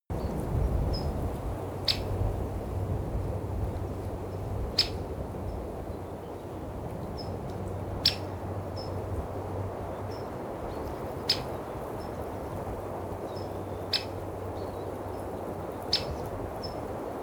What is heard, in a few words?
birds twitter wind